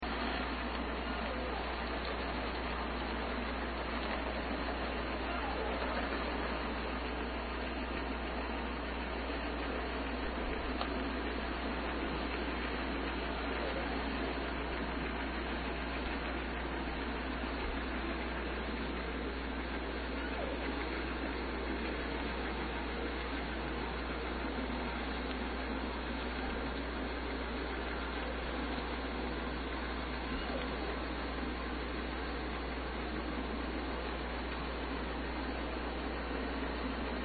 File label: few faint S1 calls, os